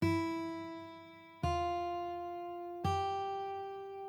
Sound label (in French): corde; marionnettes; Repiquage audio